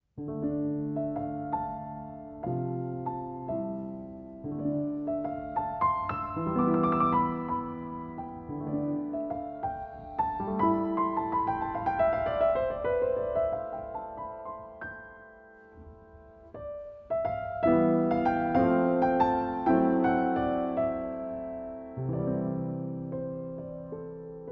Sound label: Piano Variation; Symphonia Original Soundtrack 2024; The Heart